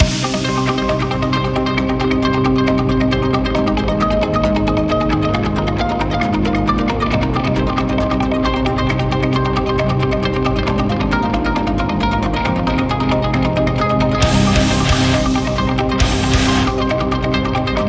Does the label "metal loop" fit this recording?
Yes